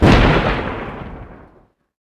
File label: lightning